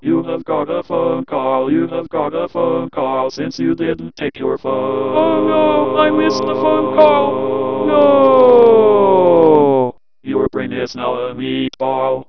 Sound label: Phone Call, Ringtones, DECtalk; Aksel Christoffersen